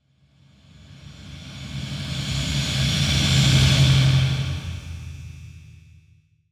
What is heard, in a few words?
Riser